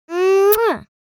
Little girl kiss

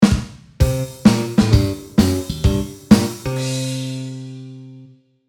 Comical funny moment cheesy band sitcom

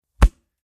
Solid martial arts or karate blow

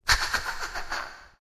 Goblin cackle with reverb

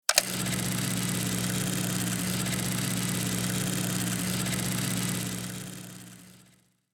Vintage 8mm projector running

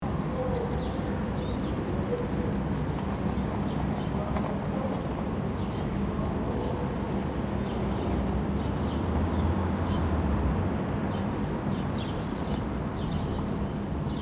Empty playground distant traffic bird activity

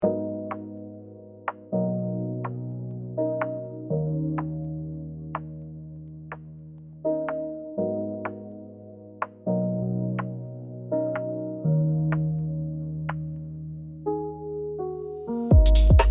Soft pop beat lifestyle

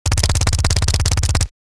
Thompson submachine gun suppressed burst; war

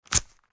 Removing dart from holster

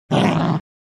Angry wolf snarling

